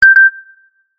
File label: call end